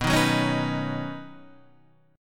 B Major Add 9th, B, down, Guitar Standard; Badd9; strummed; JGuitar

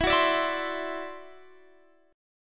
E 7th Suspended 2nd Sharp 5th, E, down

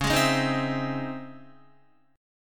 C sharp Minor Major 9th, Guitar; C#mM9; strummed; JGuitar

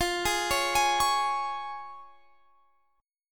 C sharp Major E sharp; C#/E#

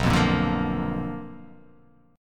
B Minor Major 7th Flat 5th, chord

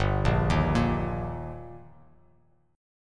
G Minor Sharp 5th G, chord; arpeggiated; JGuitar

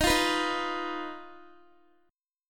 D sharp Suspended 2nd Sharp 5th D sharp Guitar; D#sus2#5; strummed; x,18,17,16,15,x

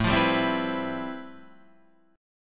G 13th A, chord; G13/A strummed

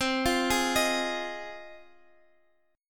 F Minor 7th C

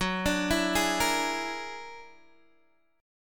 F sharp Augmented 9th, Guitar, DADGAD; 16,17,14,13,13,x

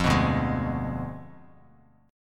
D Diminished 7th D down Bass Standard; strummed

